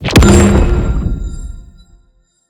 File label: atlas ground slam; smite; god atlas